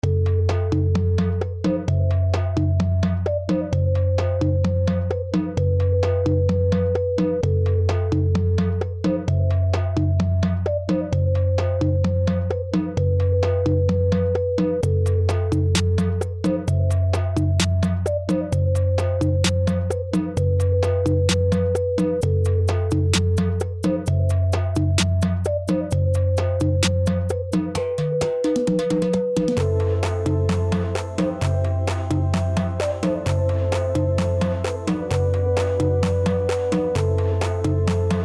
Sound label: drums; dark